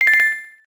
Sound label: GUI naming confirm; Pokemon Essentials; PKMN Estelar 1.3